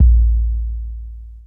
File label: Kick B Hit; 081 Bmin